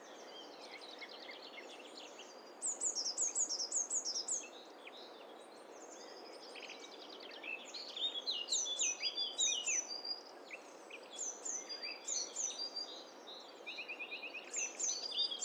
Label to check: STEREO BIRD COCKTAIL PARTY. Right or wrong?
Right